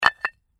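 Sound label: Brick Impact Or Cinder Drop; Stone slab, brick or cynder drop to the ground and settle.; Rock Sound Effects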